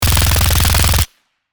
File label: Special forces submachine gun full auto fire; gfxsounds